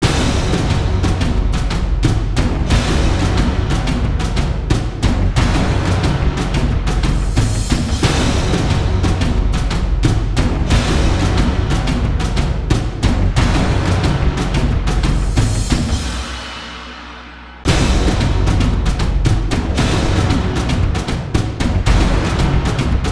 Drums Fast; трейлерная музыка

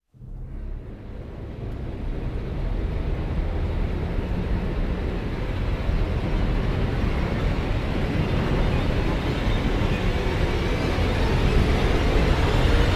Orch Rise

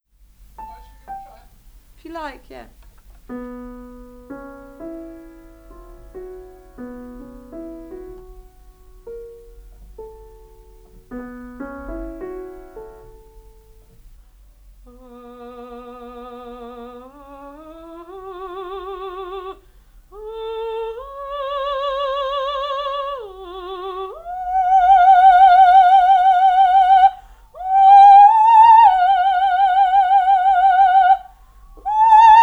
Soprano Vocal Rehearsal

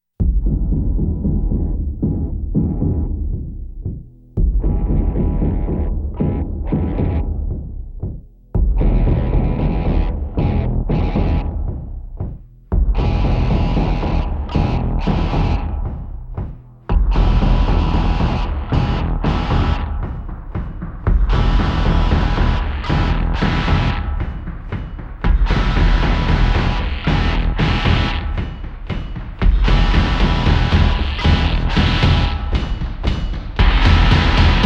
No Hi Synth; Sound Design

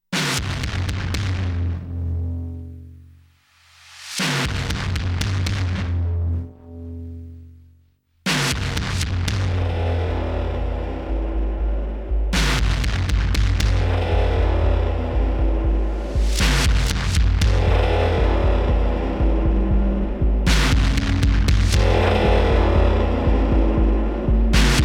Provocateur Lite Perc; Sound Design

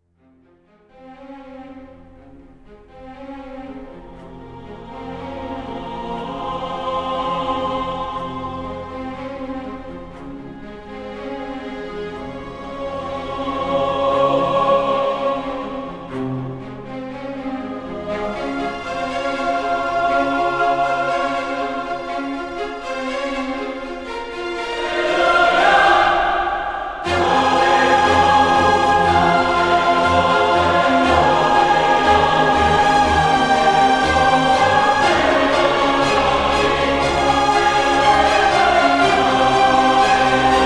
Orchestra And Choir Only, Epic Choral Action; трейлерная музыка

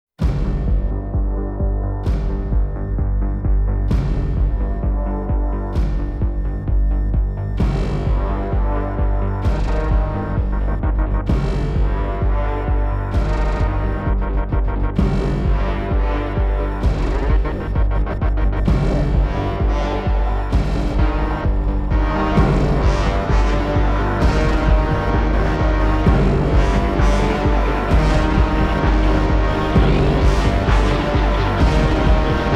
Arising Less Perc

